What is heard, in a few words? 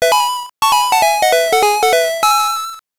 Stage Clear; Type-A; Yoshi; GB